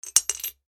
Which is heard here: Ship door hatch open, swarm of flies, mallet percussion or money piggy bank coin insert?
money piggy bank coin insert